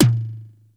tom, plastic drum; Crypton - DrumBase5000

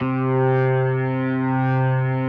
C3S, B3

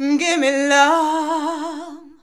GIMME LOV2, FEMALES, Hyper Dance

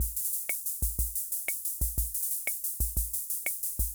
Korg Univox SR-120 Loop, drum machine; 300 Drum Machines; Korg Univox SR-120 Sample Pack

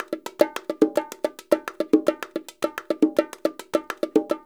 percussion loops 108; Sampleheads; NYC Percussionworks; 90 s Sample CDs

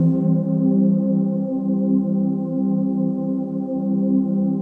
TRANCPAD; Best Service; XX Large Pads; Sample CDs

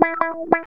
GTR, Funky; Zero-G; Funky Elements

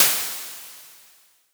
Crashes; LIMAT Div Dept; Drums

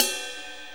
Bell Ride, Cymbals; Sonic Foundry (Sony Creative Software); Drum Tools

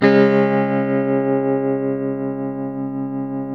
TELE CHORDS MAJ D, Guitars; Spectrasonics; Hans Zimmer Guitars VOL-1

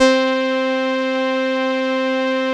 505, String Machines

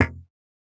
Stab; neuro; Optiv & BTK

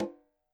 CL op s 1 R, KILLER CONGA, Roland MV8800

